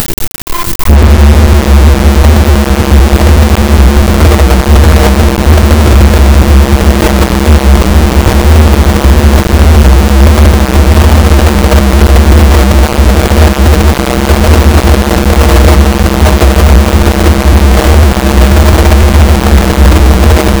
soundscape ambient extrme, contemplative

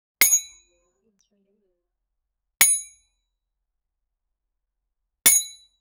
slicing a glass object wi, glass

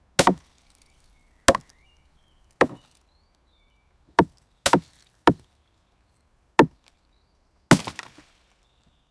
medieval wood chopping; scottish

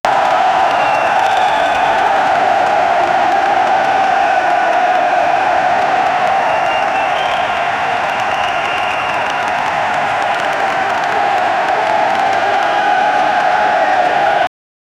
noise of sports competiti; event

